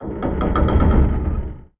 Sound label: Door